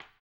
HAMBONE; ILIO; Vocal Planet VOL-2; Blues & Soul

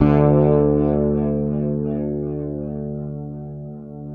FINGERED, Bass; Sampleheads; Will Lee Bass Library

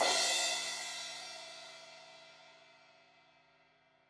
Crash 16in edge, Cymbals; Deeply Sampled Drums

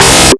DIST SHORT 3, Big Beat Samples; Big Beat Samples & More; AKAI; DISTOTHER KT

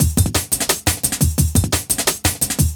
DNB BREAK; m8 breaks

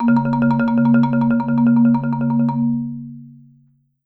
Marimba roll F#2; Sampleheads - Dave Samuels Marimba & Vibes

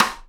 SIDE STICK, Live Snares

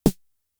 Tom, Toms; Fricke Schlagzwerg; drum machines

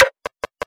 GUN SNARE; DRAGON; M8

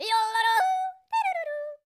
YODEL, World Voices; ILIO; Vocal Planet

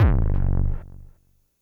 kick; Big Fish Audio; Hardcore Breakbeat Action; Core 129 Cmin

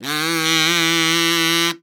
KAZOO F3; Xsample VOL-9

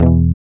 E, Acoustic; Sound & Vision; Gigapack I CD 2; GUI ACOUST.; GUI Acoustic 1

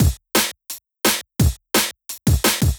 Steppa, Loops, breaks; m8 breaks

